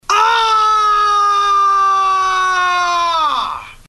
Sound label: Scream; Segue